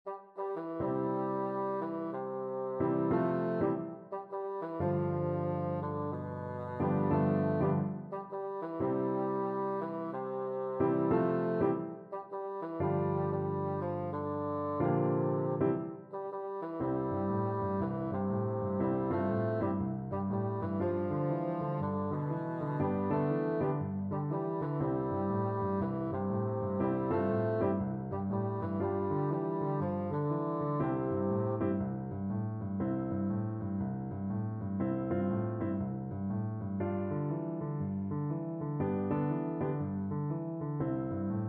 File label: whole world, bassoon